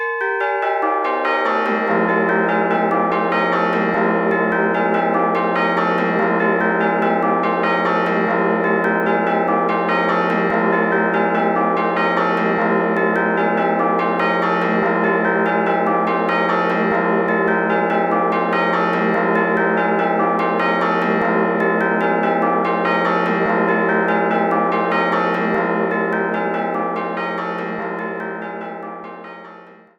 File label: old peal, bells; brighton